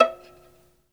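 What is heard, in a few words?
solo violin, strings; ILIO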